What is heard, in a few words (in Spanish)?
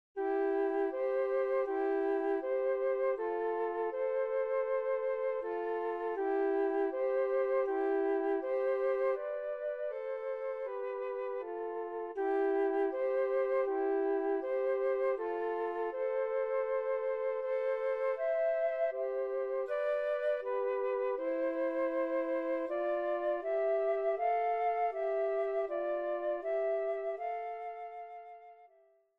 flauta